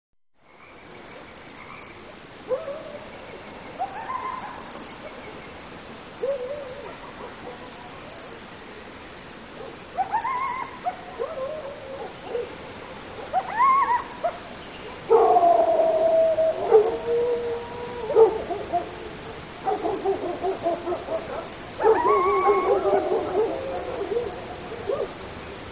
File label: Continuous Coyote / Dog Barks And Howls With Crickets And Frogs In The Background.